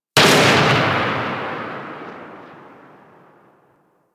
Sniper Rifle Tail; Gorg

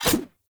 Large Dagger Whoosh Heavy; Weapons; Enemy; Daggers

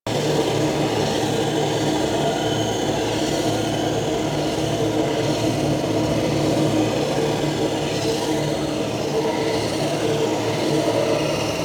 Cave Door Loop; Warframe; The New War; Narmer Outpost

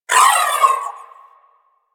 Briefcase Akimbo Pistol Alt Proj Whizzby; Warframe; Weapons; Corpus

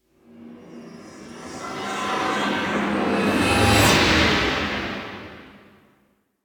Kill Choice Transition Whoosh; UI; Warframe; Kuva Lich

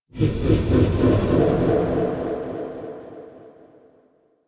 Evil Spirit; Peculiar Mod

